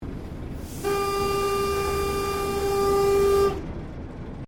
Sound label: Train Diesel TGR X Class 1951 Tasmanian Operating Cabin Whistle; Bellarine Tourist railway vehicles; Trains